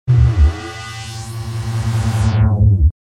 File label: reverse synthetic mellow